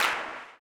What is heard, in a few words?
CLAP, Blues Soul; Spectrasonics Vocal Planet